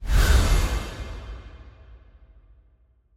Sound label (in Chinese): 球探转场; nba; 球探系统